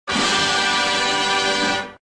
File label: tadaa